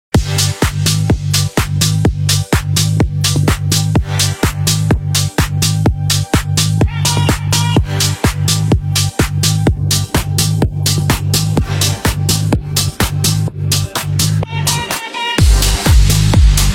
Deep House, Tantsevalnaia Klubnaia Muzyka, Tantsevalnaia Muzyka, FONK